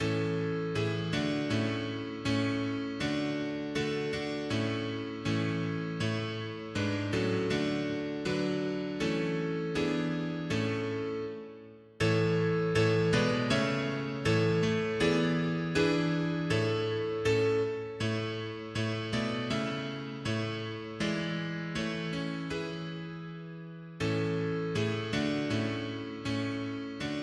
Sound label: mono piano; hymns; Himnario grande